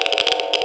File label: geiger